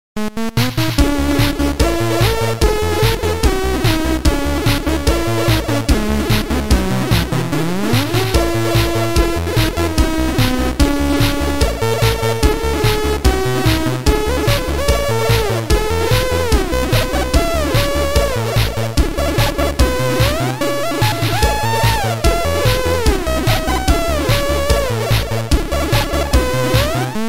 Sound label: Happy Easter Tune